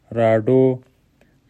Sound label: radio